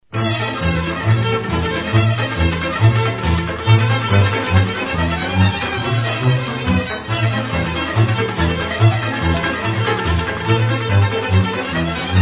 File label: Hora De la Goica Romanian Oltenia